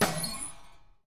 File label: Trash